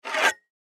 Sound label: Plastic Pole Scrape, Plastic Pole Scrape Cement; Foley; Scrapes; Objects; Blastwave